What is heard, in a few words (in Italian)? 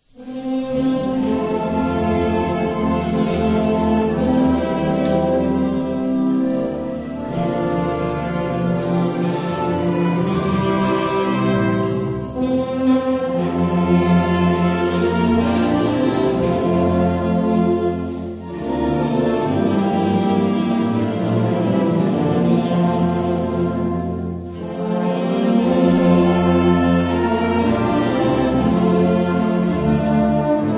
banda ave